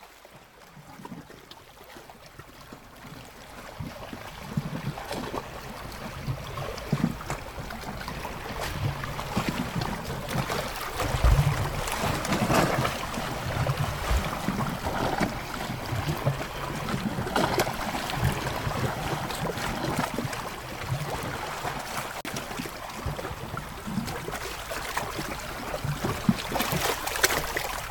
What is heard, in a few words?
seabreeze water sounds